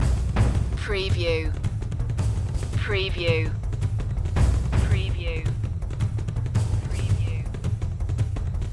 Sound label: african fields loop